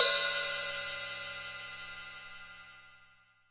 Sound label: K-ZIL HHT, 14INCH K-ZIL HI HAT